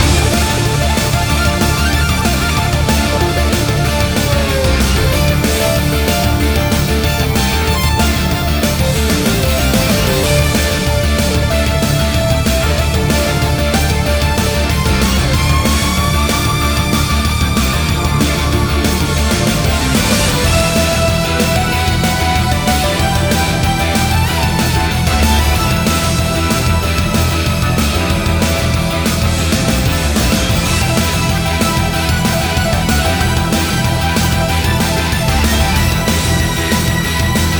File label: Entrance Loop; Jitsu Squad; ps4 ps5 switch windows xbox one xbox series xs; Frostjawfortress